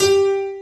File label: TRINITY PNO; Pro-Rec - Synthsonic